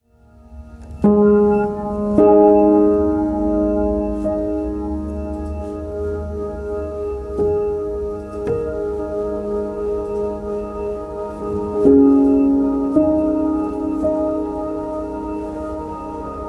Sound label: Piano Movement II